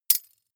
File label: midsize metal thin hit double; industrie-wirtschaft; baugewerbe